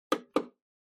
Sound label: PC Operating Noise Button